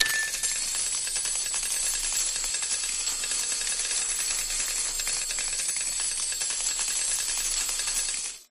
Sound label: coin drop loop; Recode; rampage riches Desktop